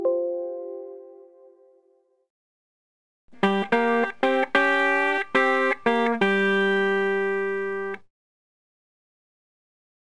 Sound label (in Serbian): Gitara